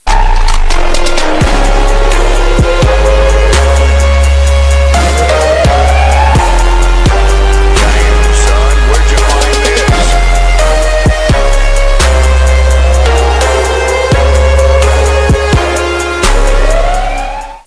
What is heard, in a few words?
drip car